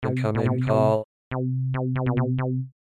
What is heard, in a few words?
Incoming Call